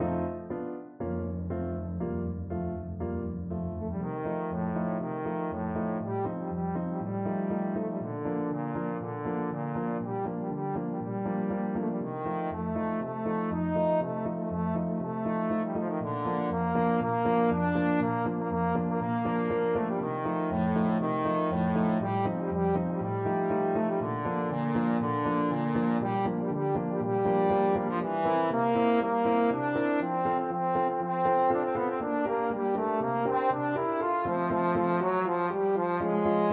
trombone

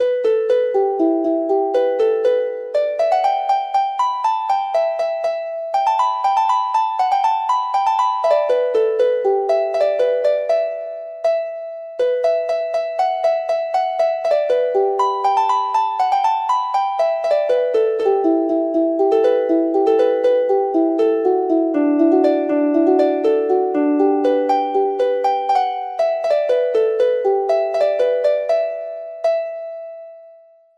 harp

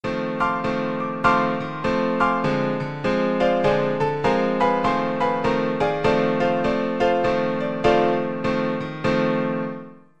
piano

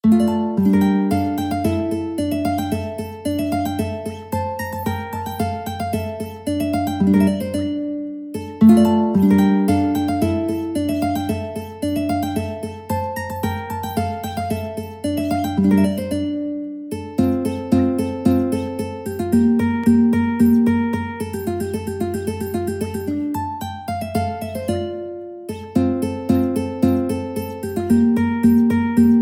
Weaver Gallopede, mandolin

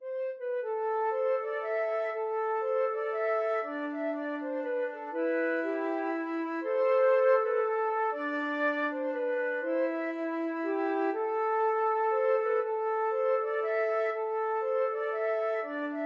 carolans flute duet

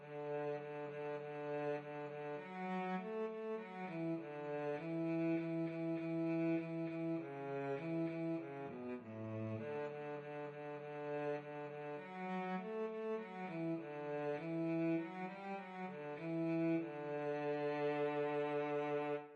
10 little indians, cello

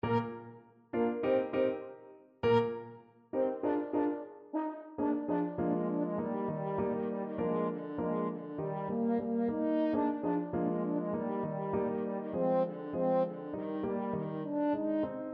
pizzicato polka, french horn

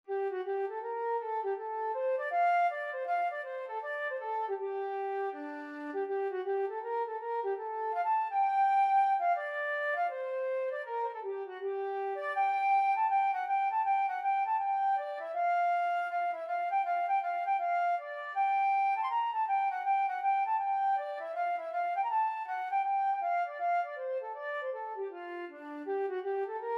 flute

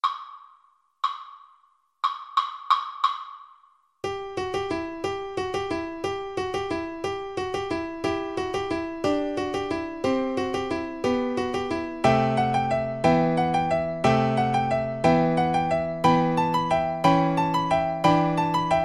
carol of the bells, Guitar

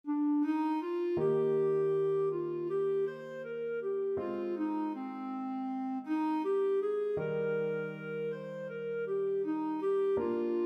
danny boy, clarinet

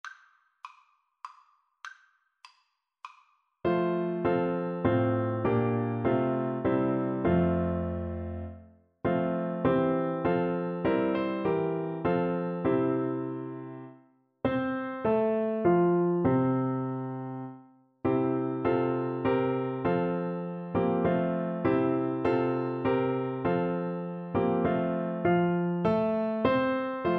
Come, Thou Almighty King for Trombone

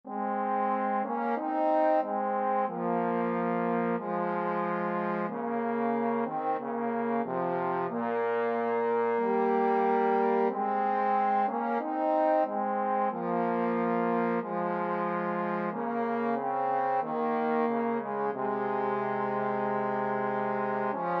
bleak midwinter trombone duet

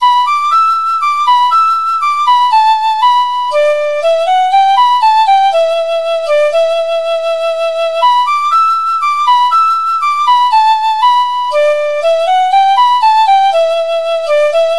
tin whistle

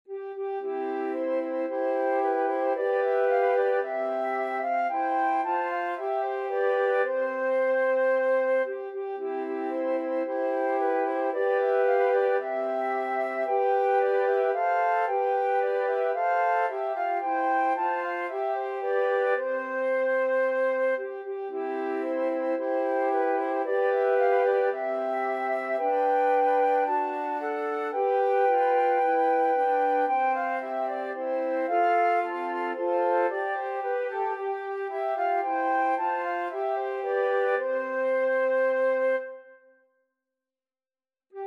twelve days, flute trio

